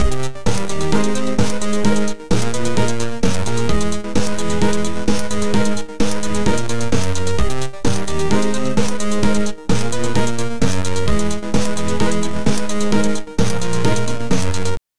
sci-fi electronic 8-bit chi, chiptune

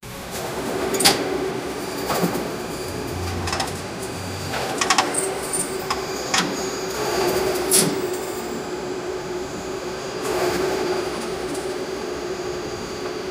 the sound of an overhead, machinery

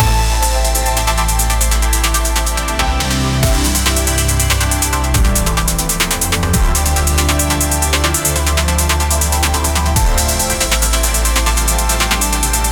synthwave trap instrument

